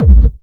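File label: hard bass drums; Bazzdrumz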